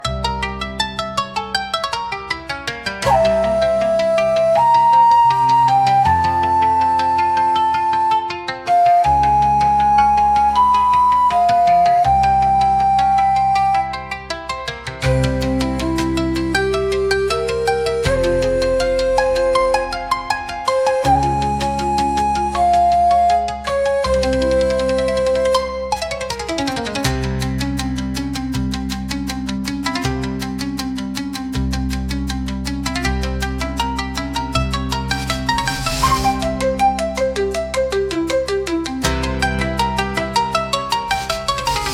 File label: Crimson Temple Rain, emotional japanese ballad